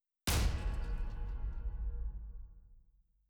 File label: impact metal; Netease; gank